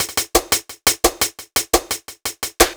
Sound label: Hi-Hat Clap Combo, Hi-Hat Loops